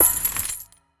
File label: empire game settlement ui upgrade money coin enhance; shimmer